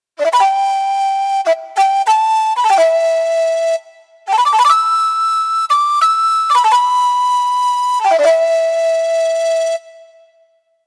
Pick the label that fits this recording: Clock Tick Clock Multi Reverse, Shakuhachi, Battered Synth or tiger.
Shakuhachi